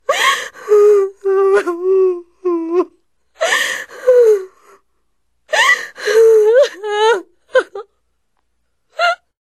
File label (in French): pleurs femme